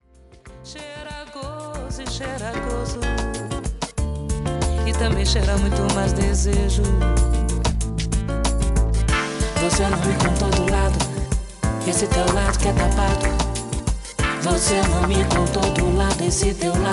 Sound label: Brazilian Groove